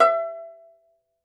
Tenor Banjo, Plucked Strings

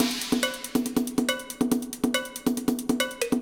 Beat Full Perc, 140bpm, Grime; Zero-G Sound Sense Grime Scene; Construction Kits; Astoria

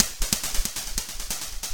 percussion loops, Techno; Best Service ProSamples vol.45 - Techno ID; PS-45

